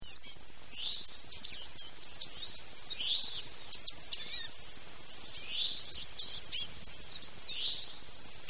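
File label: blue-gray gnatcatcher song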